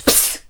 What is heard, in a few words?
NOISE, CLASSIC VOX; Best Service; Hallelujah